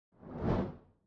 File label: whoosh